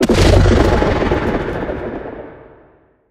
音爆声; 监守者

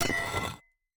斧刮削声

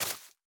苔藓破坏声

